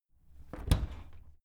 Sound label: dishwasher open